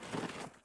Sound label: prone asphalt; Escape from Tarkov